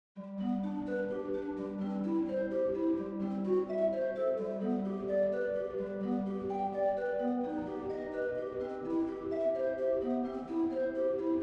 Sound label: OrganTeq Study; OrganTeq II; Schumann Study